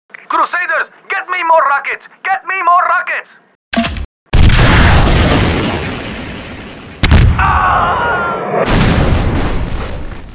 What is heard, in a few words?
death rpg; hl2dm